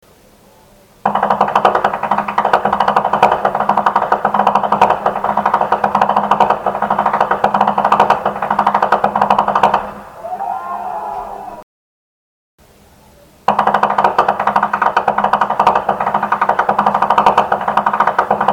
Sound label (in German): schnalzen; feldkirchen